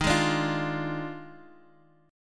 D sharp Major 7th Suspended 2nd Suspended 4th, Guitar, Half Step Down